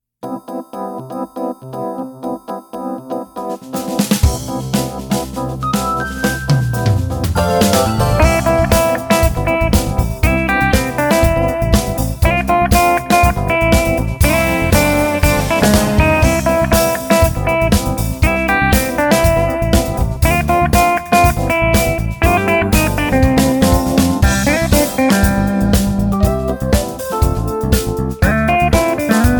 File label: JINGLE JAZZ short; Garvin